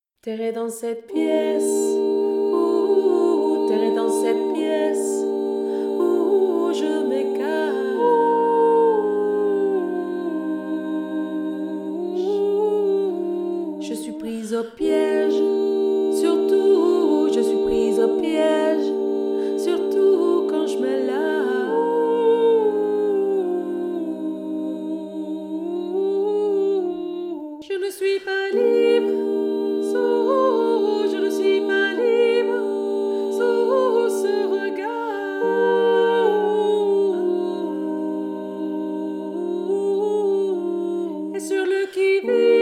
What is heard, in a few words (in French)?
toutes les voix; LE LOUP